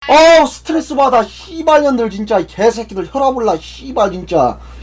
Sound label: scout negative vocalization